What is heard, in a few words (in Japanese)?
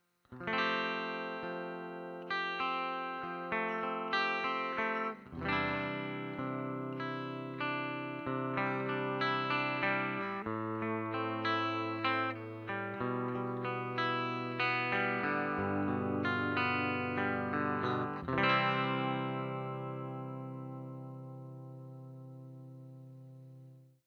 タップクリーン